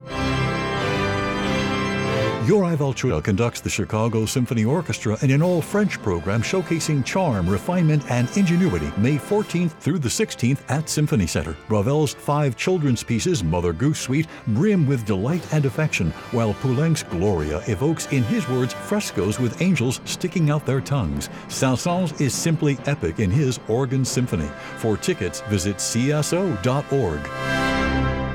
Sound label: Organ Symphony; Poulenc Gloria & Saint-Saëns